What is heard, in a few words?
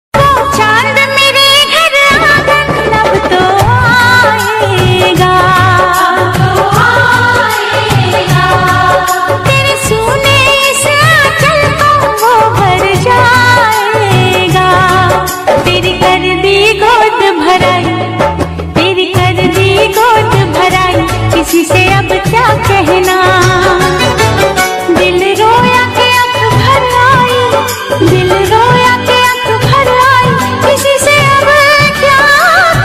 female version hindi ringtone